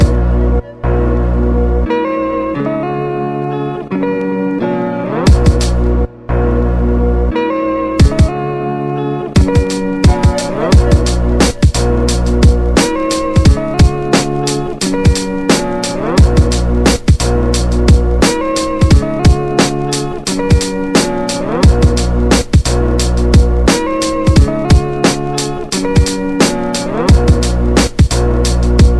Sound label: Pretty sound